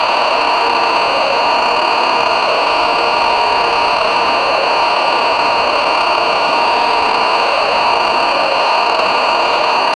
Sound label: very versatile loud static